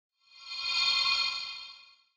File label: idle spell; smite; god ganesha skin11